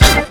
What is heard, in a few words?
HIT, Hits & Brass